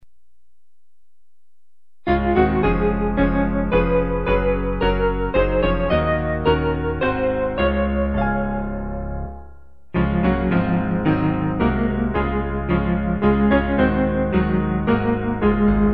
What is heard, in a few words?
Hymn Hallelujah Praise God; Hymnal